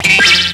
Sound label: BOING